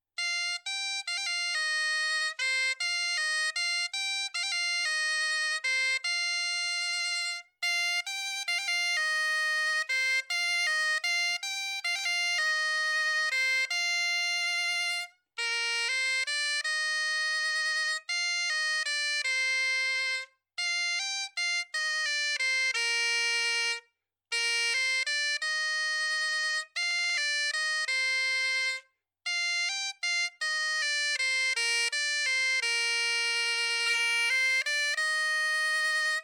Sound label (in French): MELODIE